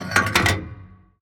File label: gun reload; World of Tanks